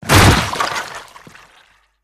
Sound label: Bloody Body Fall; gore stabs & eat